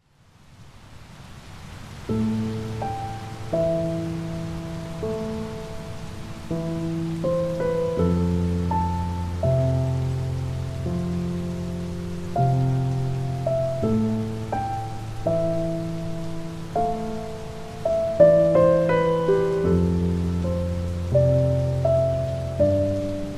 Calming Piano Music with Rain